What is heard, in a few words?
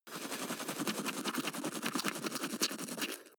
teeth brush dry closed front fast